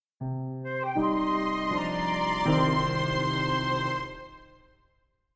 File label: sting generic tragic; The Sims 3; Windows